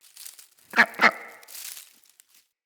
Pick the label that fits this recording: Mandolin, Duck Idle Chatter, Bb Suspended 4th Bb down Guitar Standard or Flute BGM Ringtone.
Duck Idle Chatter